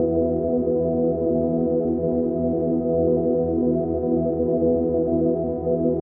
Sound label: pad Eb, synths pads; weatherreport